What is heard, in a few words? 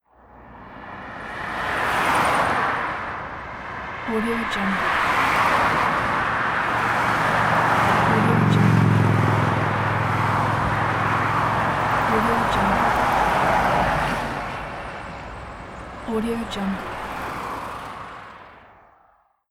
city traffic ambiance